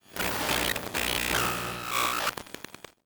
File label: Sfx Fuzz Swirl